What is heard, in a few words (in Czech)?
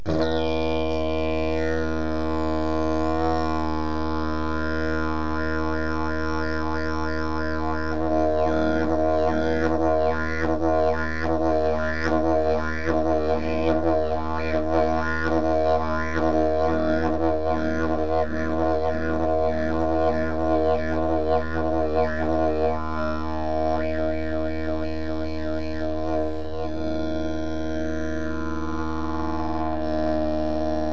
jilm, didgeridoo; jilm cestovka; drevene didgeridoo